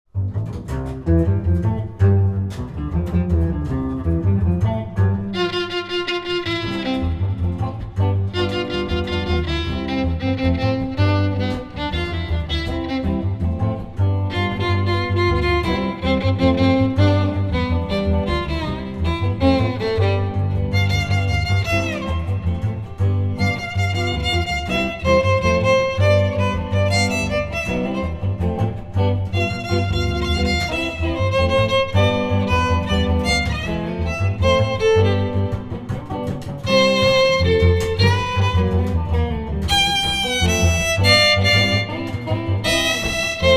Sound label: Latin, String Trio